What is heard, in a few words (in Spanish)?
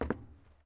Paso zapatill madera